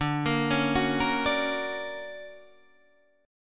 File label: D Augmented Major 7th down Guitar Standard; D+M7 arpeggiated; JGuitar